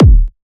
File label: Kick, Techno; Drums; One Shots; Ghosthack; Drum Mega Bundle Demo